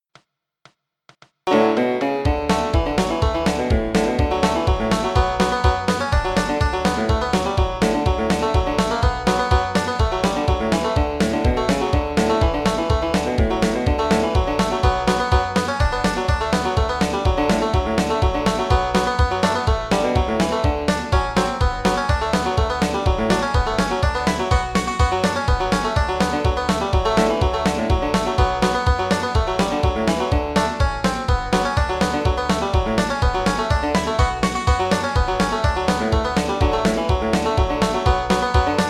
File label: Bluegrass Banjo fiddle D; Cherokee Shuffle